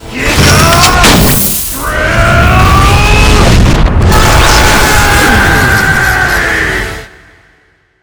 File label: drill start, spiral cannon